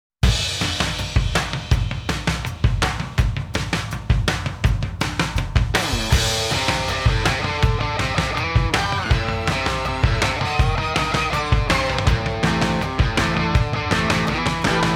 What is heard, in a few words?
Instrumental; Gintama